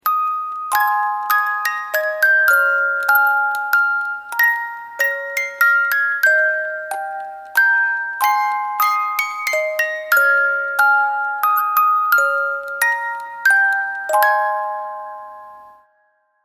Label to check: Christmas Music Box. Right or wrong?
Right